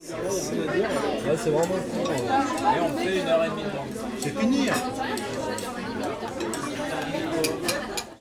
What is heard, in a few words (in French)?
bistrot; olympus ls-10